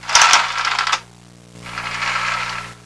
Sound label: BLINDS, HAUS